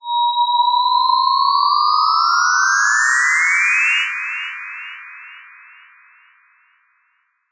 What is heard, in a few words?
cartoon ascend whine tone space